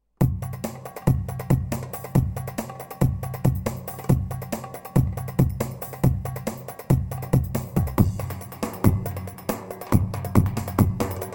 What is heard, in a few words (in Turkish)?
roman ritim pa 500 tr